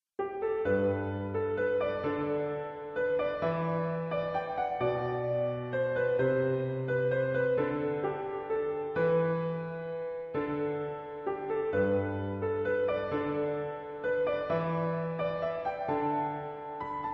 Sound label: melody